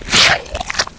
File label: bullsquid chew; hl2